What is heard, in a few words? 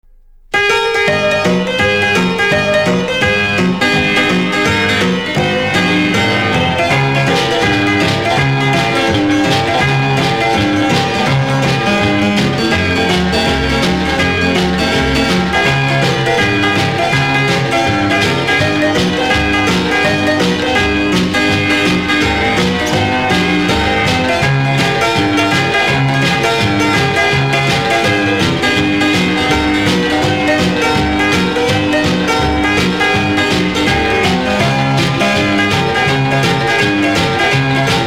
Piano